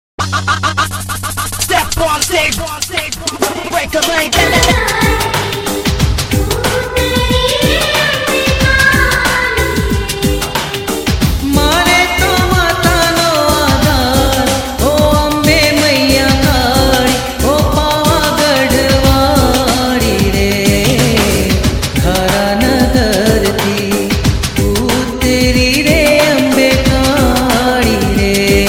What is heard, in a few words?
navratri